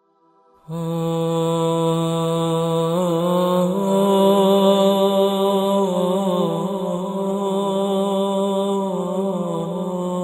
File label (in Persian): Vocal Gham